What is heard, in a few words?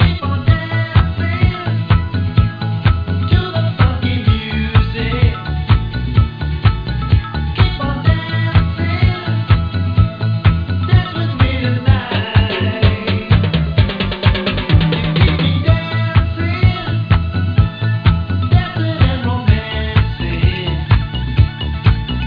nervous